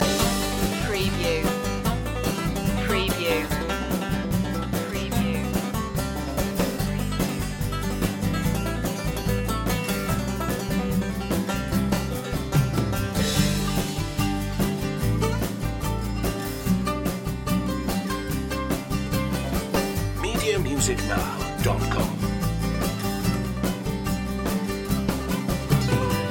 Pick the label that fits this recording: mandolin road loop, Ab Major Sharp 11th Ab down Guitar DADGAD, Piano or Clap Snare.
mandolin road loop